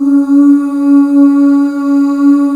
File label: F.CHORUS C#3; AKAI S-Series CD-ROM Sound Library VOL-8